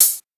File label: OPEN HH